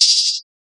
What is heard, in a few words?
Phonk Open Hat